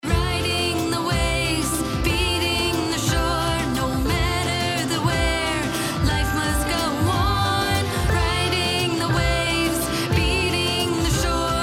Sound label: Sample Country Mixed